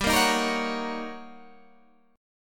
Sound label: D sharp 7th Sharp 9th